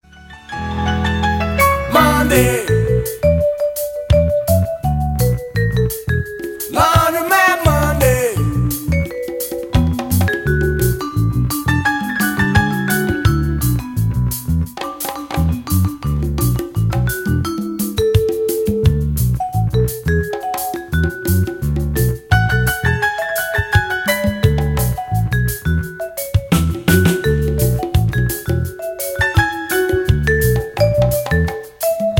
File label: xylophone